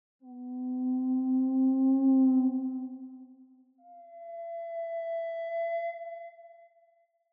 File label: Whale Call Answer Close (echo), Underwater Whale; Varazuvi; GDC Game Audio Bundle